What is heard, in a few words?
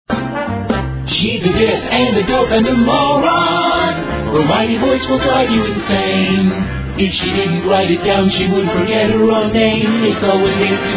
jingle